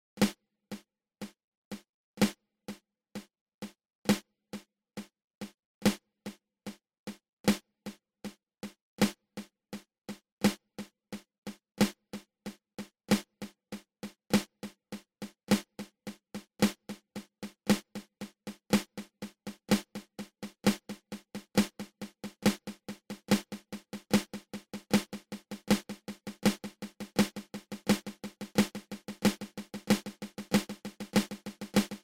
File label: Flam Paradiddle open close open